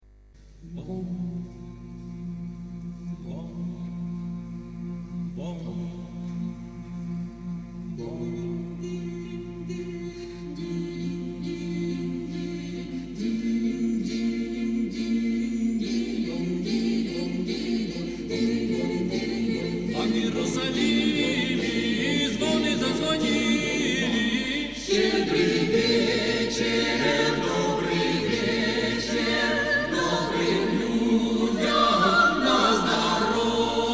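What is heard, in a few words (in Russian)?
хор; hor boroveckoy cerkvi; a v ierusalime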